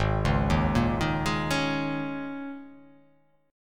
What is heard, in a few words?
Ab Suspended 2nd Suspended 4th, Absus2sus4 chord; arpeggiated; JGuitar